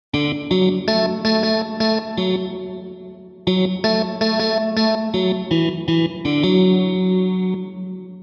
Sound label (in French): xylophone gimmick, Xylophone et synthé